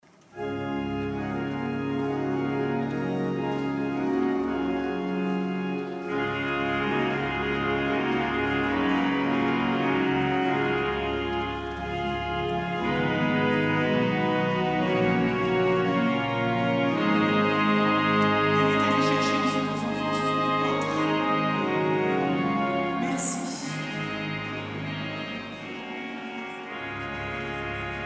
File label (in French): Orgue Sortie; Daniel Matrone; Sortie 1e Communions